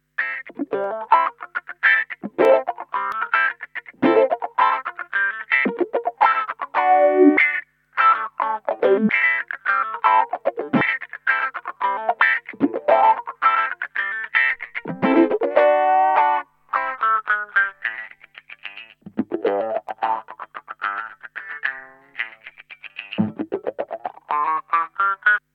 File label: Funky HP, filter fx